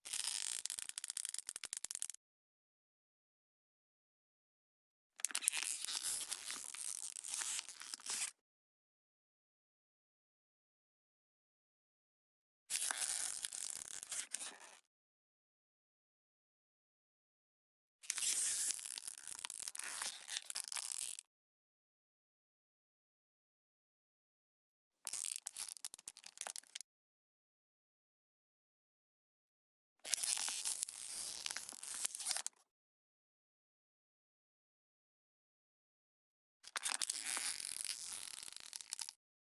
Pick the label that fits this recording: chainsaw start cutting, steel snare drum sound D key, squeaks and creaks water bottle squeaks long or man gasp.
squeaks and creaks water bottle squeaks long